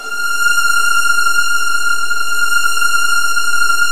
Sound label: F3, Virtuoso Strings; ILIO; Kirk Hunter Virtuoso Strings VOL-1